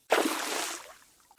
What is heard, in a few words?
Splash